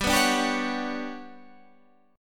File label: G Major Sharp 11th G, Guitar Standard; strummed; JGuitar